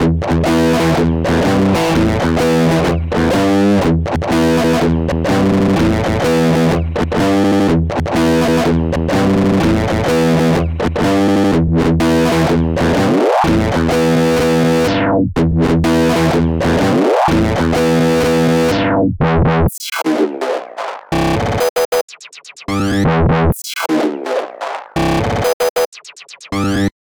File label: Electric Guitar